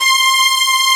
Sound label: STRINGS C5, Analog, Synth; E-MU Formula 4000 Series Vol. 3; Analog Odyssey; Synth Builder